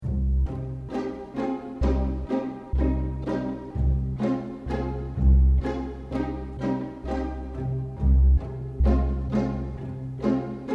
loop

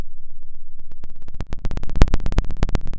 pop, Sound of a fire crackling

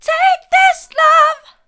take this love mid, vocal

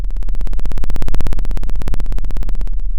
Sound of a plane taking off

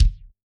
Kick, drum

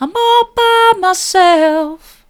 soft, vocal

